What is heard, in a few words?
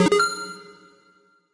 Slots coin; Pokemon Essentials; PKMN Estelar 1.3